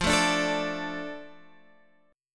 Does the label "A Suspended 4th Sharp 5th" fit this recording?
Yes